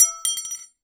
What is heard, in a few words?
Aluminium Tube Drop